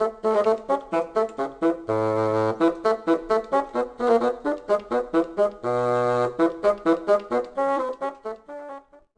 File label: bassoon